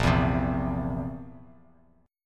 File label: Bb Suspended 2nd Flat 5th, Bass Standard; Bbsus2b5 strummed; x,1,2,5; JGuitar